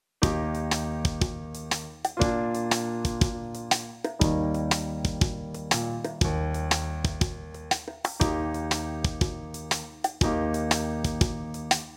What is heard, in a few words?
Impro 3 chords in Eb, brass, practice